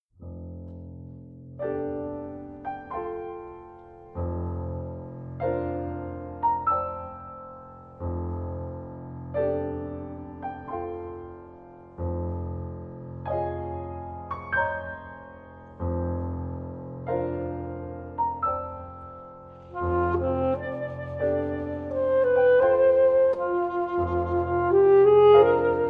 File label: Sax Sonata